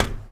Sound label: metal step; Golden Crayon Sounds